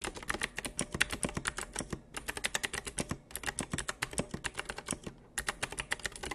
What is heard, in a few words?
keyboard